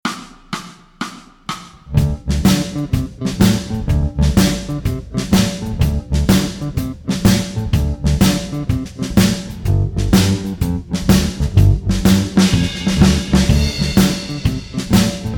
Slide Jam Track backing; Guitar Techniques 2017 Issue 274 October

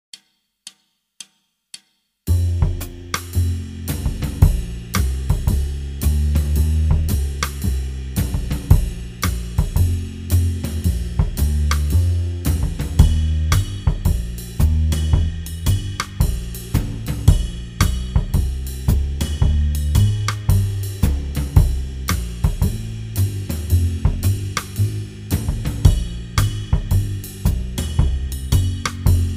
Jazz backing, Guitar; Guitar Techniques 2018 Issue 278 February

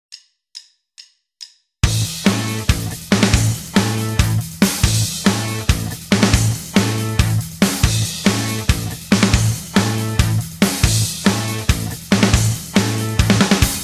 backing; Guitar Techniques 2017 Issue 266 March

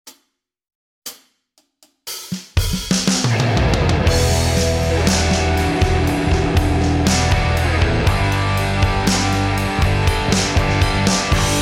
Blues backing, Guitar; Guitar Techniques 2019 Issue 297 July

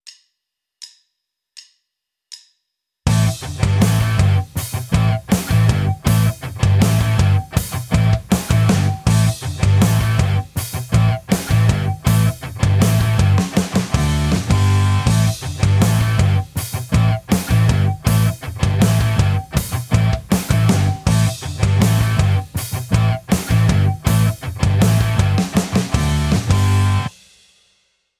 Tapped Harmonics, guitar; Rockschool; Guitar Techniques 2019 Issue 290 January

